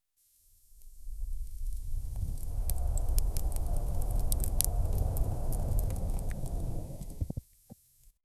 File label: gentle sounds of bagpipes, outdoor